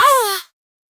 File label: player crash pain